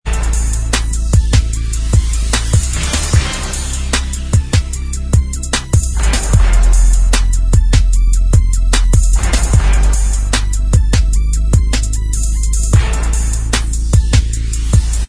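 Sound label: instrumental, track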